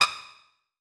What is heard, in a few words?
Multimedia Internet CD-Rom Flash Beep Mechanical